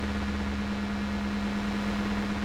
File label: killing Sound electrik